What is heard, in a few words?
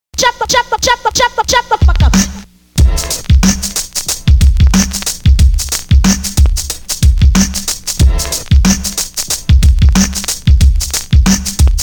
breaks; Super Duck Breaks